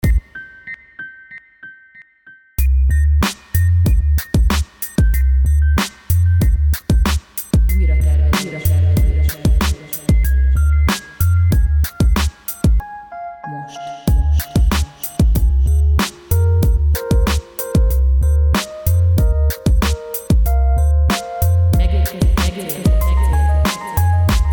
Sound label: loop; Muzax; Ujratervezes